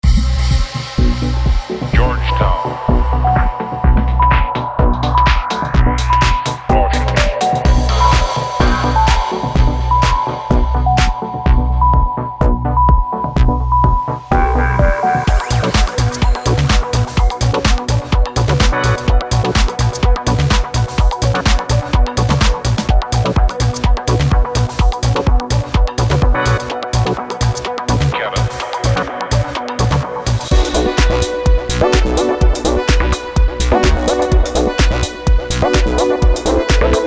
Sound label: progressive tech house